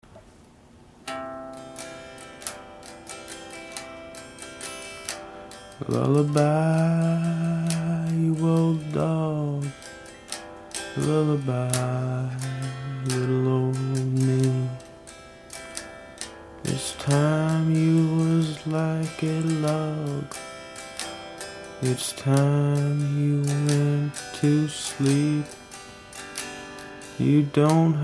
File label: Lullaby